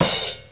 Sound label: cymbal